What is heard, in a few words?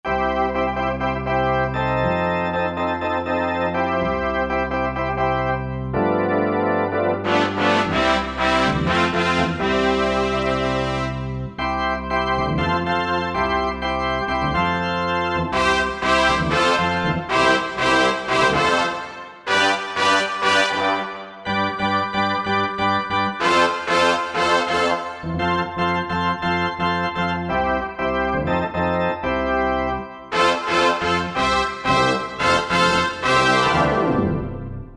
Org Brass, Korg MSC-2S Synth; Synth 1